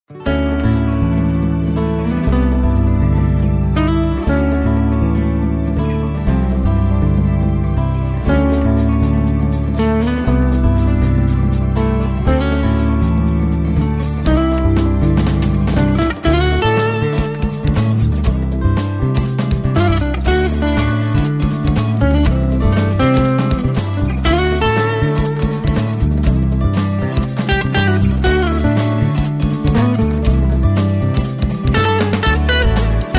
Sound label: jazz